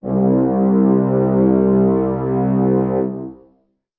brass acoustic, muted trumpet

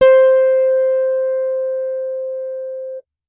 bass electronic, electric bass finger

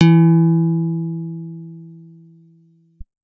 guitar acoustic, acoustic guitar nylon

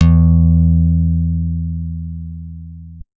guitar acoustic, acoustic guitar nylon

